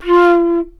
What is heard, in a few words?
flute, D brass licks